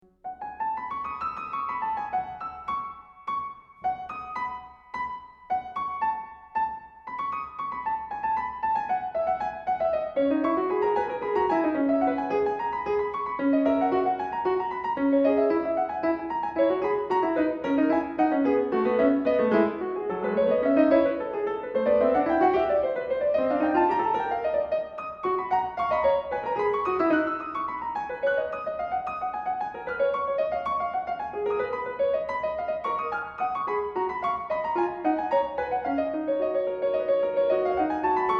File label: fugue